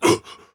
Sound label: monster damage, voice